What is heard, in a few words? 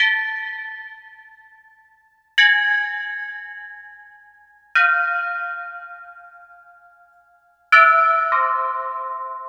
Pelog Unison Tube; Melodic; Ambient-Melodics-II